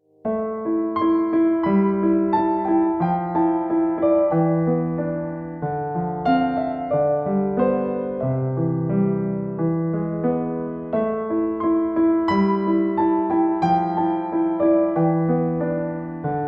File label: piano